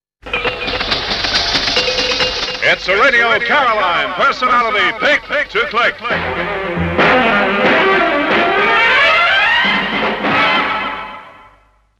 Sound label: jingle; Caroline; personality pick to click